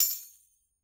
Tamb Acoustic Lite

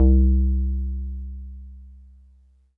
Wood Piano DX100 C2

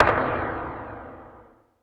Clap Canyon

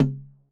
Tom Acoustic Dead

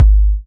BD

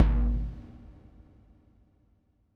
Udu Fuzz

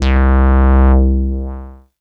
BASS

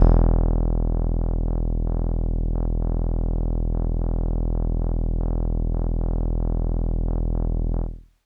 Kawaii E0; keys

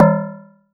Perc; EFM

